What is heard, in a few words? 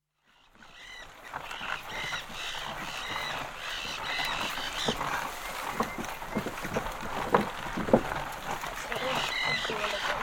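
Oxen pulling wooden cart through swamp (recorded from cart) occasional distinct speech from drivers, also splashes; Outdoor; BBC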